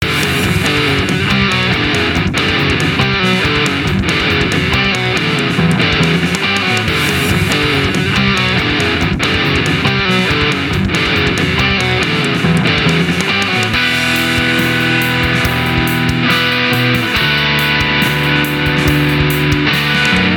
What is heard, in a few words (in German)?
Speedmetal, aus Garageband